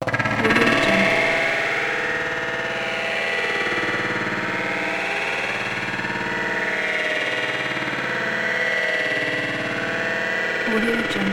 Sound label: scifi transformator; soundcreator